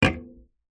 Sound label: food drink can soda full down on wood surface; zapsplat